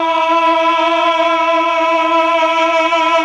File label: CHOR, Propeller Island Legendary M400; ILIO; CHOR+STRING1